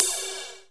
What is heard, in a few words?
FX Cymbals, Percussion; Dance Synths